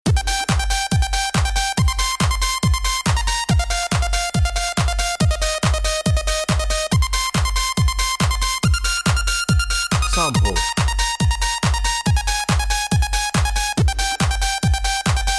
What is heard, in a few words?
techno, backing